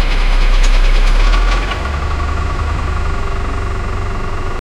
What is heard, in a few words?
bus starting sound, public transport